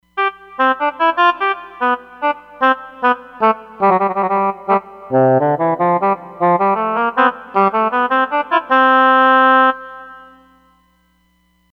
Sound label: Hall Oboe; Roland Fantom XR